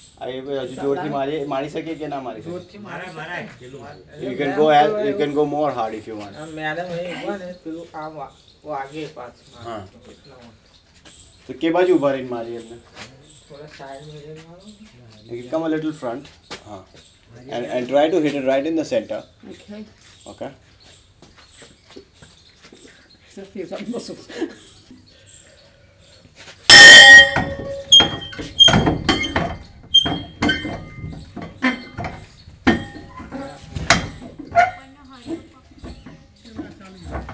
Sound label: gong